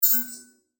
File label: Tank Expel High, Scifi, Tank, Expel, High, Pitch, Flange; Science, Fiction; Spacecraft